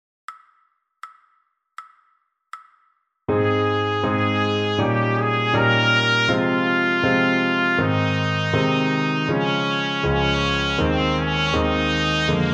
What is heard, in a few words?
trumpet